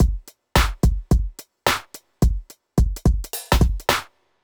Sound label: clapper 108